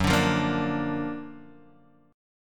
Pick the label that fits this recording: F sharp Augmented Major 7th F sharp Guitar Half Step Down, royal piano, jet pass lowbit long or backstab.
F sharp Augmented Major 7th F sharp Guitar Half Step Down